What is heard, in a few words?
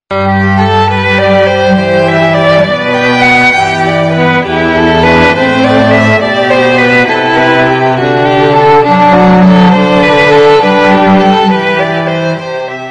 classical